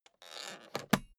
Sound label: suitcase close latch lock